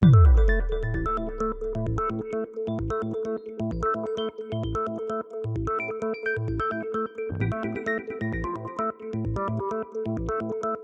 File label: pause loop; 『Mikan』